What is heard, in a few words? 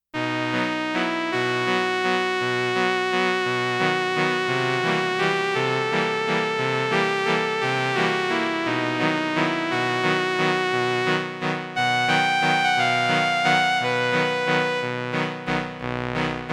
BRASS with vibrato & sustain, Roland RS-202